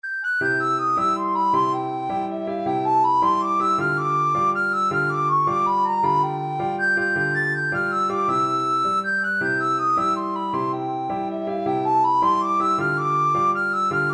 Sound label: dance, recorder